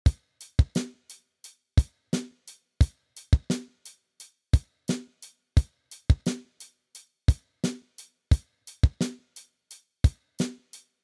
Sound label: naked drum